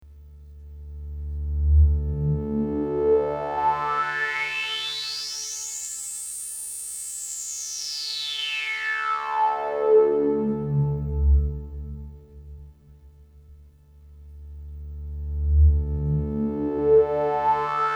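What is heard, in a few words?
voltage-controlled filter band-pass frequency sweep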